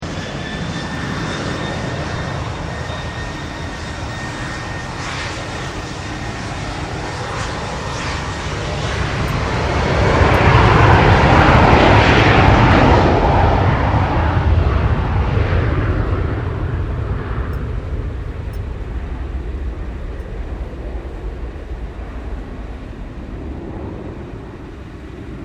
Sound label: AIROPLANE JETFIGHTER IDLE LAUNCH FLYOFF; Vehicles; Airplane; Avalon Airshow 2005; Airplanes